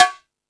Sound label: Latin Drums, E-MU Pro-Cussion